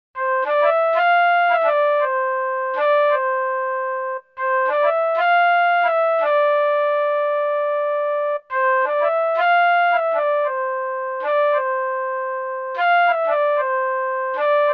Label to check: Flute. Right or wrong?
Right